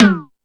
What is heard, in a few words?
Hip House, Drum Machines E-MU Pro-Cussion